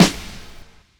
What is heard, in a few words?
Snare; Snares